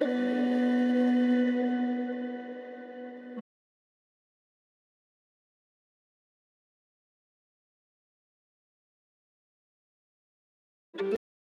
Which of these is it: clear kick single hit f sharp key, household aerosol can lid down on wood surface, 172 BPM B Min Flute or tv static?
172 BPM B Min Flute